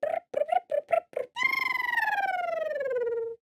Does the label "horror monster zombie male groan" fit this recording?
No